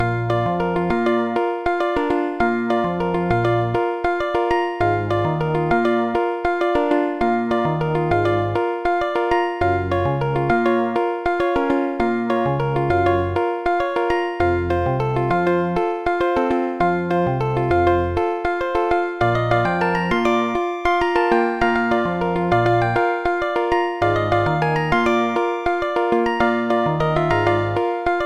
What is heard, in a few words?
latin song; The Music Studio